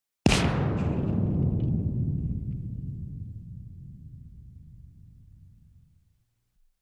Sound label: Explosion With Falling Debris; Technology